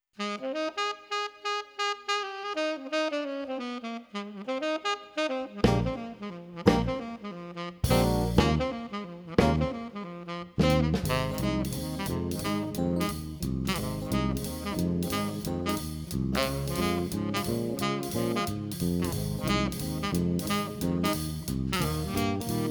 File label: Swing; Live Band; Hotlanta Dixieland Jazz